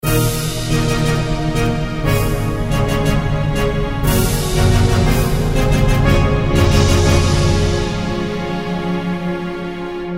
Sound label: Victory